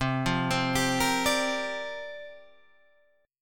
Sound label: C 9th Suspended 4th C down Guitar Open G